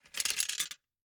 Gumball machine, metal, glass, candy, switch, mechanism, rotate, dropping, clatter; Eiravaein Works; Vaeyan IV